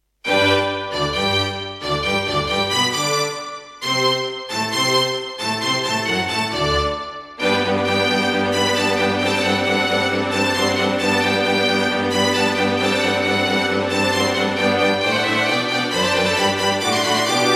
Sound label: Yamaha MU2000EX XG MODE; Mozart - Eine Kleine Nachtmusik partial; classical